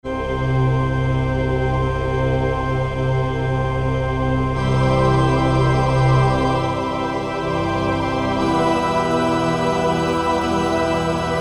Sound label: Church, Roland SR-JV80-01 Pop